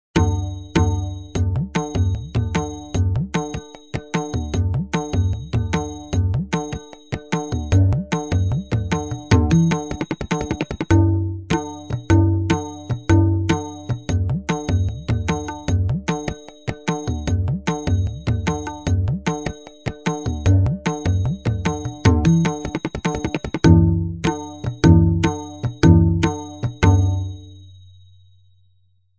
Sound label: BHAJANI, grooves